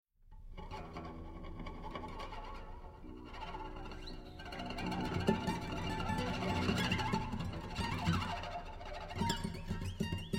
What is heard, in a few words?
Improvisation; Artistes locaux